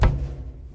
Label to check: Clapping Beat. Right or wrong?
Wrong